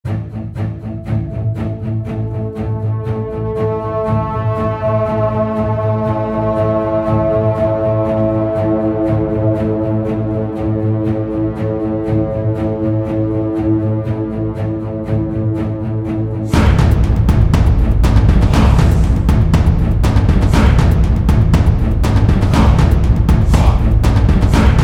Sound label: trailer; Black Baron